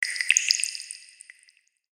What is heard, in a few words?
element ice; maoudamashii